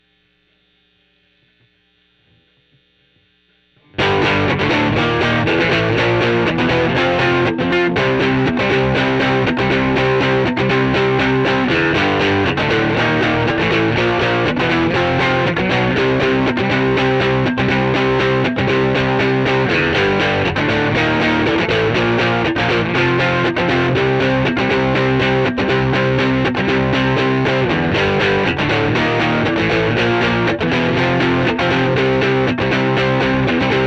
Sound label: Gtr